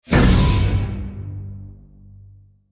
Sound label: Success Stinger